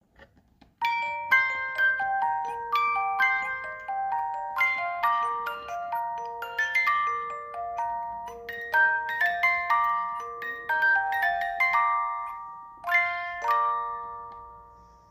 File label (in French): 18 lames, boite a musique; Boîte à musique en bois personnalisable